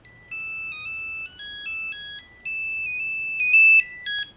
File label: nokia 3210 ringtone; brave scotland